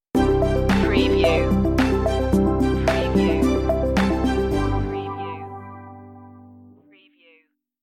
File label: corporate dreams stinger